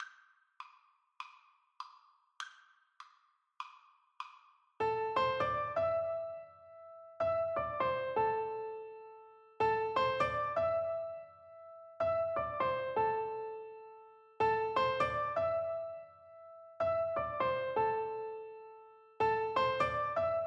Piano Duet

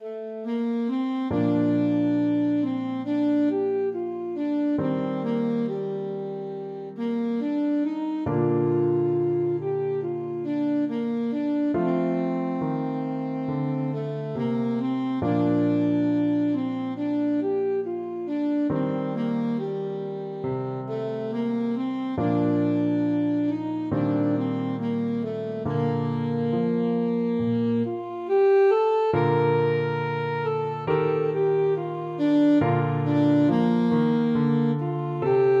danny boy, saxophone

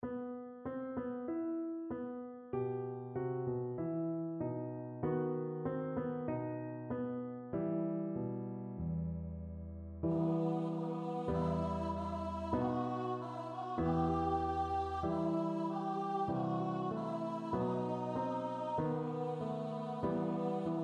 VOICE, unison choir

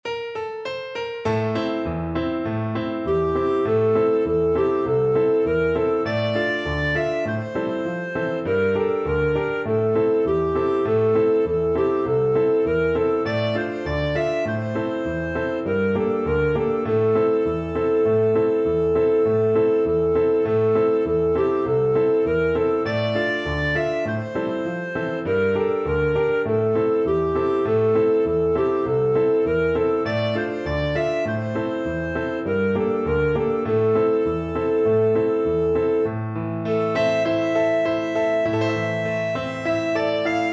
clarinet; dror yikra

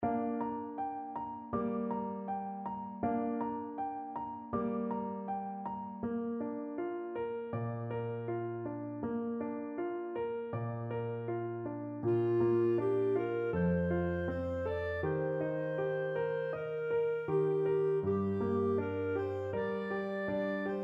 takeda, clarinet

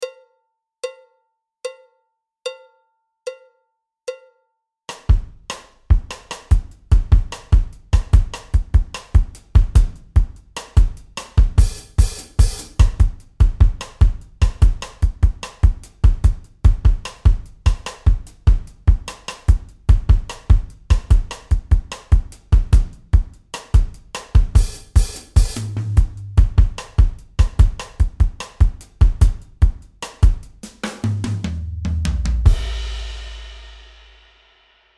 style, drums

